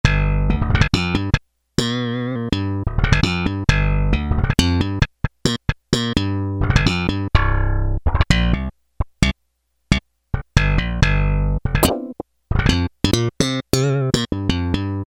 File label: Slap Bass 1, Roland W-30; Factory Performance Patches